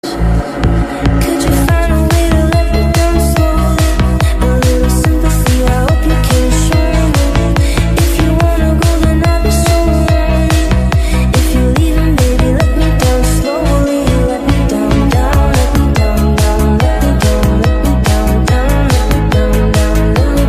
nhac chuong remix

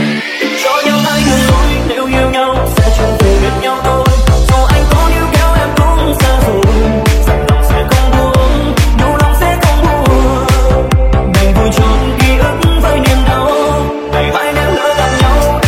Nhac Chuong Remix

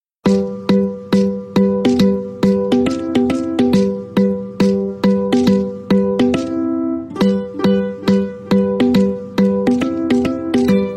Nokia Trion, Nhac Chuong; Nokia 9 Pureview

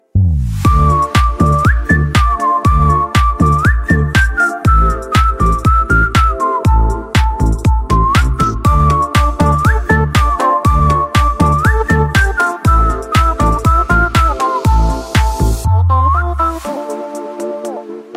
Nhac Chuong Instrumental

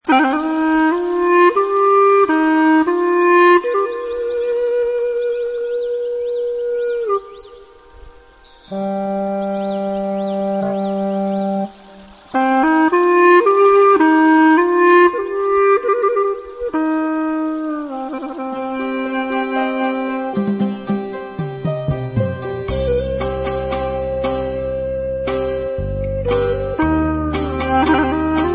tieng dan hmong nhac chuong tru tinh khong loi

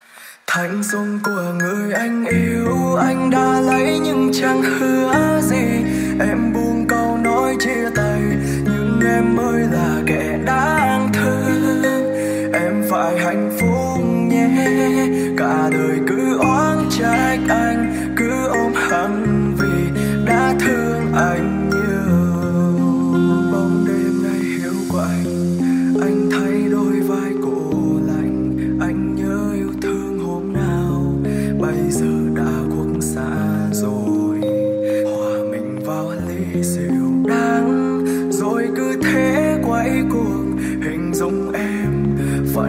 nhac chuong lofi

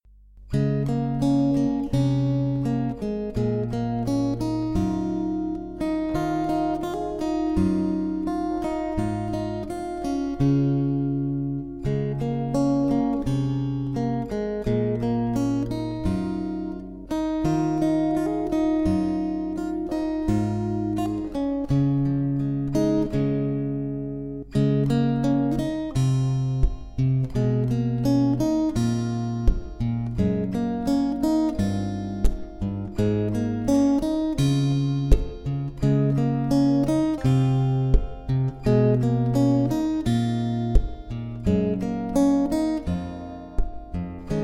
Nhac Chuong Guitar

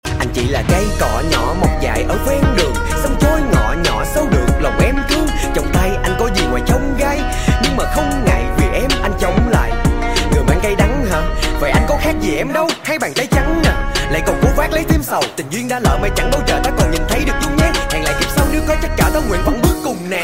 Nhac Chuong Rap

